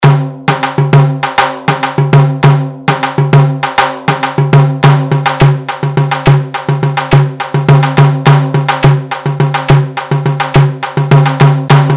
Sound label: tamte